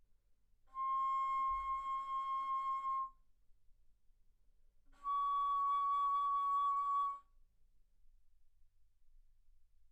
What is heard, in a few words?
Bass Flute C6 Db6; ff